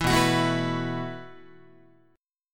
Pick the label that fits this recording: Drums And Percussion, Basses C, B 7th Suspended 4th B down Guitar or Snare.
B 7th Suspended 4th B down Guitar